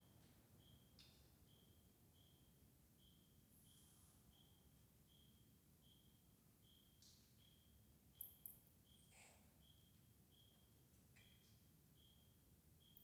Caverna angelica salao com grilo, alguns morcegos vocalizando, estalos, gr

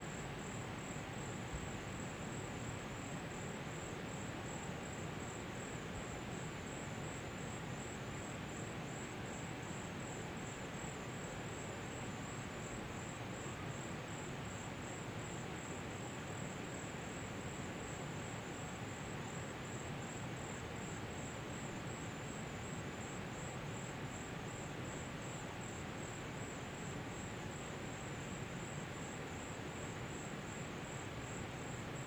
Cachoeira perto de grilos

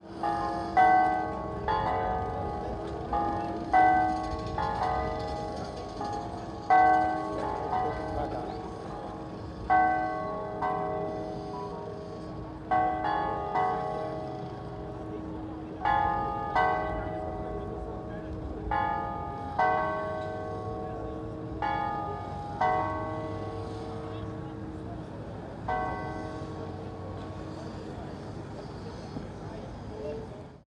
Sinos da Catedral; de Brasilia; Colecao Sonora do Cerrado

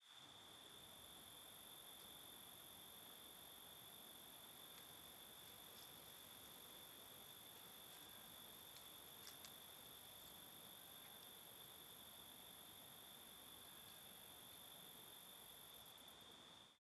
Grilo Com som de Falha e Distorcao em Mata Fechada com Mais Grilos ao Fundo; Cerrado; insetos; colecao sonora do cerrado

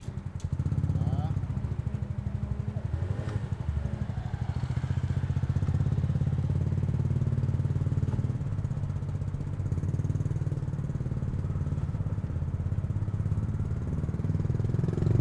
Moto andando pela cidade motor; maquinas

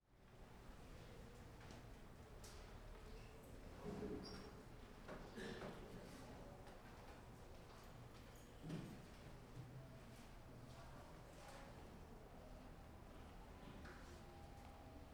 Ambiencia Biblioteca Universidade de Brasilia

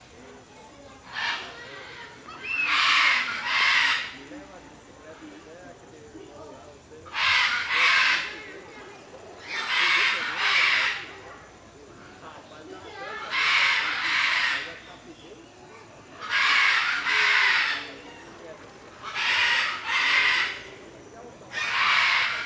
Passaros cantando em aldeia com vozes; aves

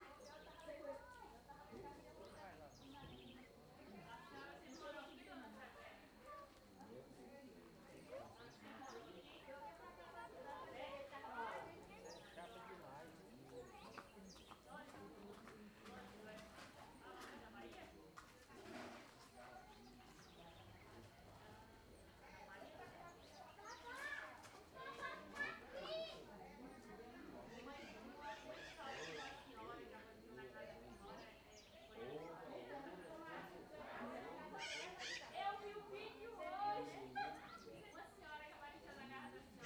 Vozerio na casa vizinha alto paraiso com crianca, passos, utensilios e ara; multidao; araras

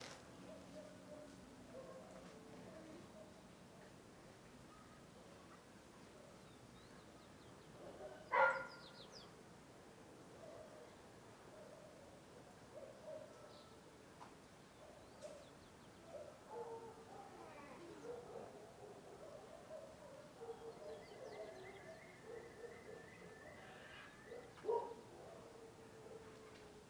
Ambiente residencial de manhã cidade do interior vozes e periquitos; ambiencia rural

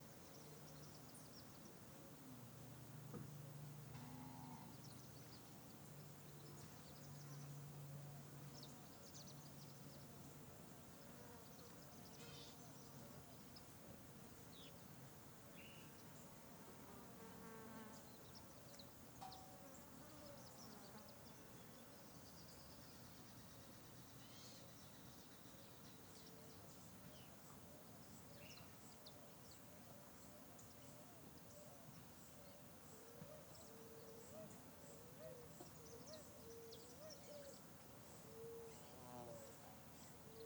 Canto pomba asa branca no vale fim de tarde; aves; Coleção Sonora do Cerrado

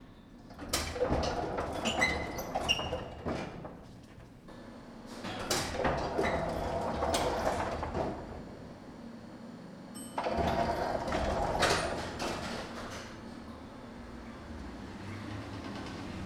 Portas de elevador abrindo e fechando

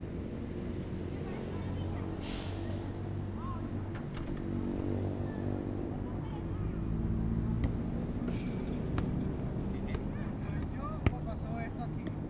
Quadra de futebol sao sebastiao com criancas e transito no inicio; esportes

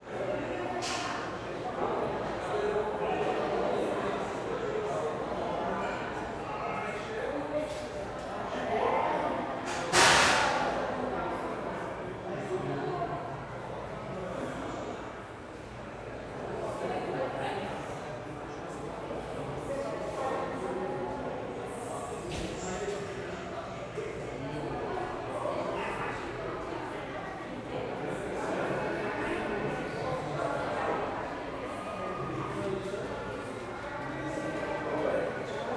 Ambiente no corredor de hospital publico vozerio som de metal; multidao; Colecao Sonora do Cerrado

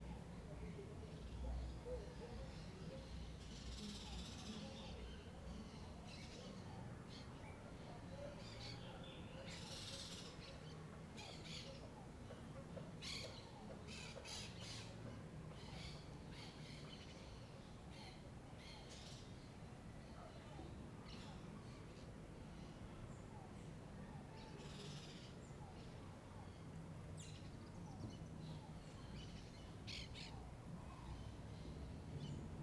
Ambiencia beco com passaros, musica e moto longe

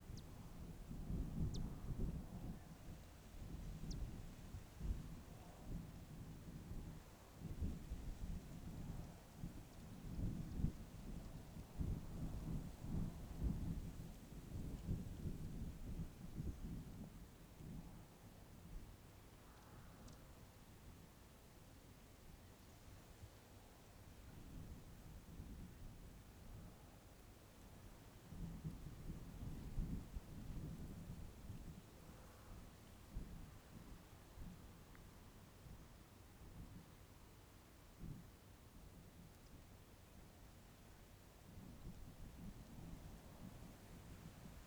Vento forte na trilha morro da baleia ao amanhecer com arvores e passaros

